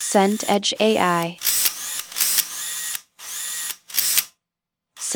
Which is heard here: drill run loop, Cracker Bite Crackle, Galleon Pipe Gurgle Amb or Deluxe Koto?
drill run loop